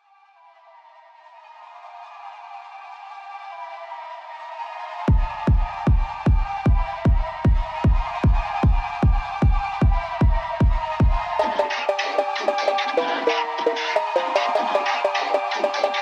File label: D PHOONK